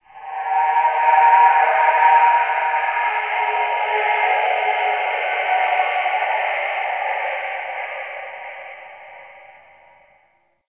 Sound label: Spirit Cry, Crypt Ambience; One Offs; Champion Crypt